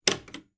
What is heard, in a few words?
alarm switch; project hawk